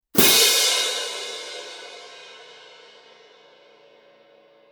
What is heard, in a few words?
sabian 18 aa viennese